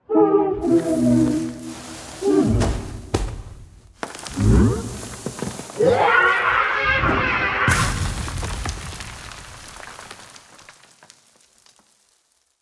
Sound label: BOSS Smooth Skin Roar